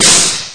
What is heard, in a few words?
somen lower far; HeavenStudioPlus; rhythmSomen